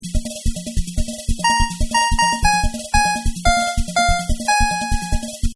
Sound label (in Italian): sigla iniziale